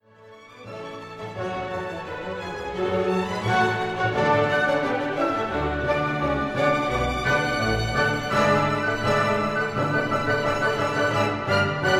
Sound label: Symphony Allegro con brio; Beethoven 7; Vorisek